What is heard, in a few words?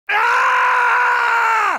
werehog scream; sonic